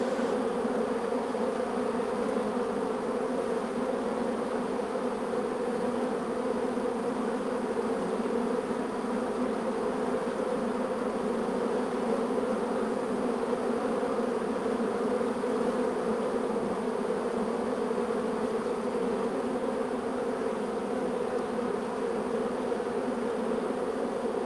bees hive exterior in front of landing zone from 1 meter; stereo ortf; sonniss gdc